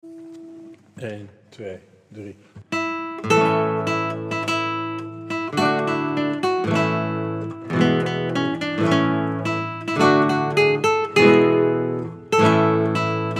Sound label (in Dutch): Hallo allemaal instr